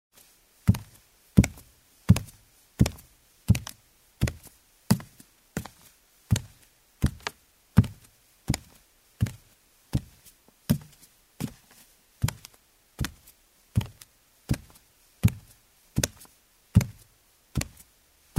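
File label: footstep sounds; zvuki shagov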